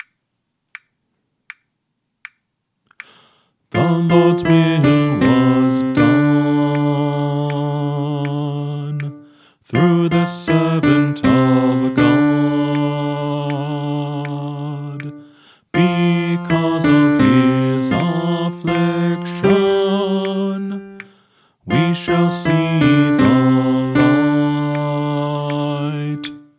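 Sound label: Song, Choir Practice Tracks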